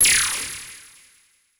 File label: CABL TEN, CABL TENS; Total Control - Action Cutz